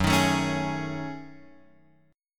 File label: Gb Minor 6th Add 9th down Guitar Open F; strummed; JGuitar